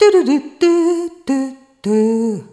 Rhythmic A

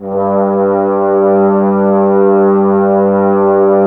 Brass, Woodwind Brass Ensemble

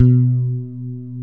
B-2, FRANGE BASS; AKAI S-Series CD-ROM Sound Library VOL-7

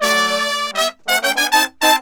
100, BRASS SAMBA

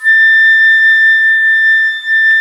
FLT XFLT, Flutes; Club-50 - Foundations Roland

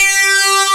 P-5 SYNC G3; E-MU Formula 4000 Series Vol. 3; Analog Odyssey; VK Instruments

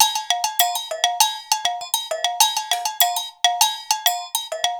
Bell Melody, Percussion Loops; Drums

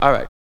VOCALS; Ueberschall; Jam Box

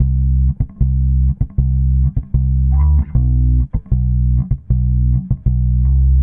Bass, Indie Rock; Sonic Foundry (Sony Creative Software); Indie Rock Anthems; Session 12 - Spaced Out Knoll

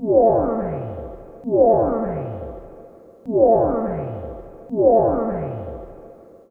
Simple Osc Loop; radiosonics

